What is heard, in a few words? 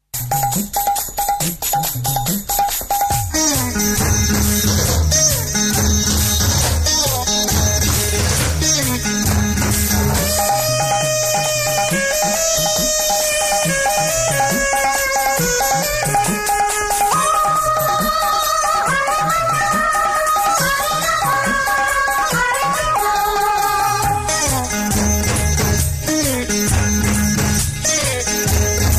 Tamil Folk